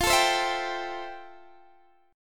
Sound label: F Major 9th Guitar DADGAD